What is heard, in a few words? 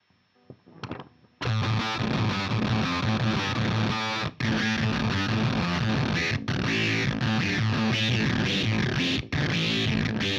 Walrus Kangra filter env-sens modern; Walrus Audio